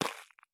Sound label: walk gravel; Escape from Tarkov